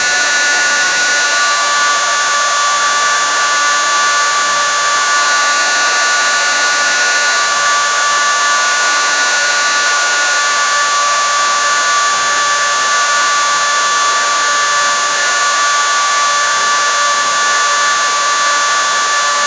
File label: hair dryer calming sound, appliances